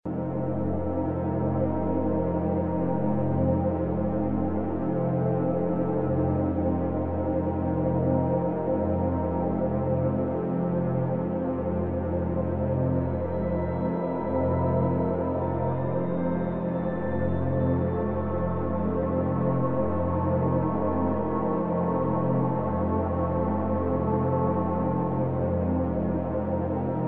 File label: Amb Sound Scape Layered, varying chords and drones; Ambient SoundScapes